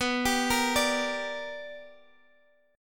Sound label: B Minor Major 7th Sharp 5th B Guitar Drop D, chord